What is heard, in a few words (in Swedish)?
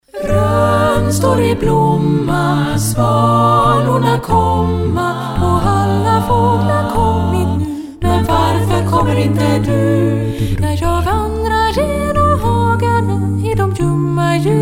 musik